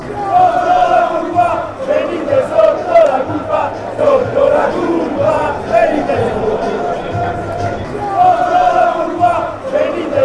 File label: lazio chant